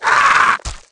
Mutant Death; Valleakan Mutant 1; Aliens

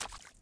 puddle, footsteps

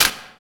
Rifle Ricochet; projectiles; Max Payne

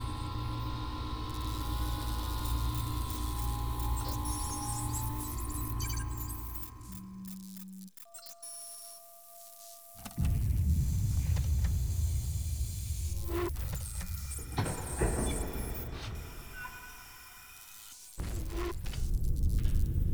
ambient one; X2 Wolverines Revenge

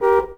shops car horn; Tony Hawk's Project 8; XBOX

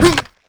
land soft; characters; Jump

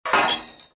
fence stop, fence gate; movers; doors; Soldier Of Fortune II Double Helix; XBOX

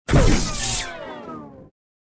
cantina blow, ambience; narshaddaa

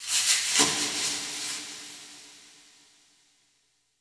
scurry

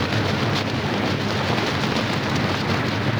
parachute; ambience; Soldier Of Fortune II Double Helix; XBOX; kamchatka

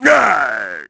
death; HADEAN; Pulse Racer

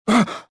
gasp; jedi

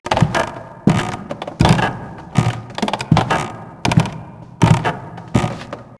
footsteps creaky above; Max Payne; ragnarock

